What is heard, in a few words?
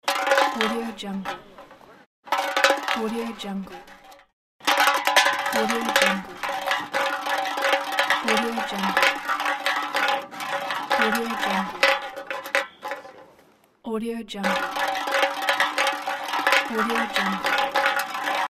empty soda can rolling away